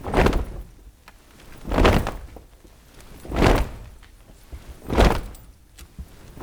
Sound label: HUM STOMP, Stompin' Feet; Roland LCDP14 Africa VOL-2